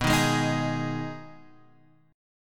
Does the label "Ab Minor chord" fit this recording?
Yes